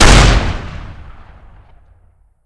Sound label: caravan shotgun